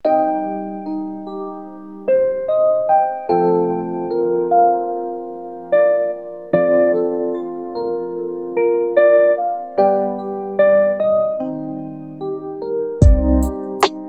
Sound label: rainy lofi